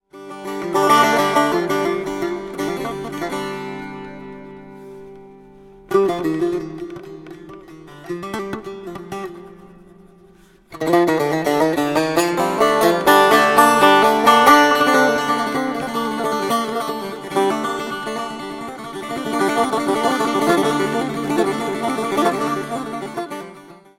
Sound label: Taknavazi Setar